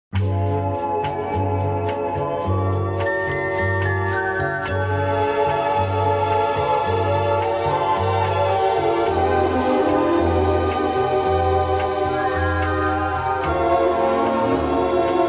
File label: Strings Orchestra